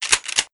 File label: Pump Shotgun Reload; BABA YAGA; Shooter